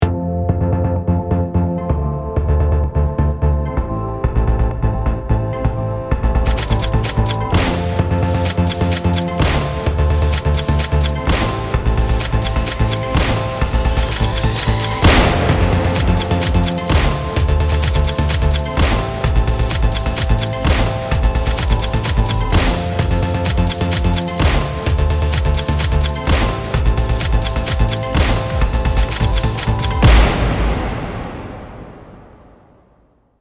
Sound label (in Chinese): 卡点愉快感音层 快闪 枪声 节奏 短配乐; 抖音快手